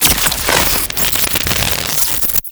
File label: Fortress Grapple Electricity Burst; Warframe; Grineer Nightwatch